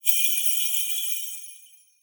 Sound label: christmas bells; festive